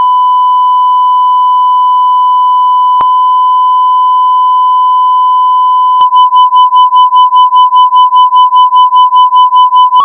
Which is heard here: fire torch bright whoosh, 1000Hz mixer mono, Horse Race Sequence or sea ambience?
1000Hz mixer mono